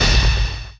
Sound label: freeze